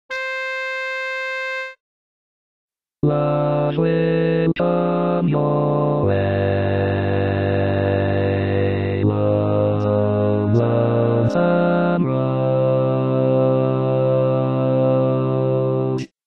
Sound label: BASS, barbershop; teach tracks